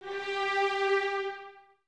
Violins Detache G 4; Miroslav Vitous; String Ensembles

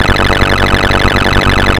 FX DRILL; ModelCycles

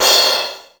DRUM UH CYMB; Greytsounds VOL-1

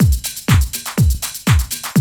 loop, 125; Sound & Vision - Gigapack I

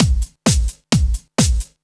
drum 130 straight; Techno Trance Essentials

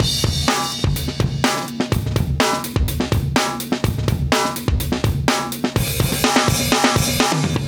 125 Funk, breaks

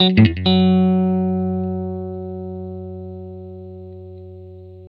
blues guitar riff, Vintage Blues Guitar; USB Soundscan vol.22; AKAI

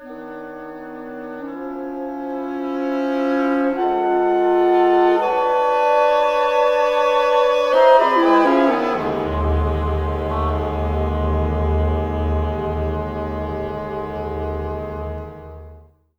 FULL ORCA F; East West; Symphonic Adventures

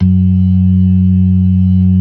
LOW MAN, LOWER MANUAL, Organ

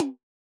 TOM F, Akai MPC-3000; 300 Drum Machines

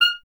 Clarinet F 0; Miroslav Vitous - Solo Instruments VOL-1; 90 s Sample CDs

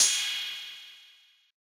Analog Cymbal; Yamaha DJX-2B

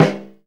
SNR LO HARD, VINTAGE FUNK; Zero-G; Funky Elements

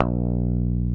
GTR ELGTR, Clean E.Gtr, Velo Chord; Roland L-CD701; Clean E.Gtr3

